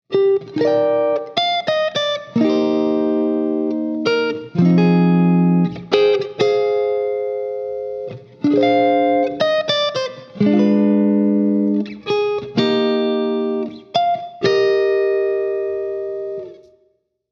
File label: neck, pickups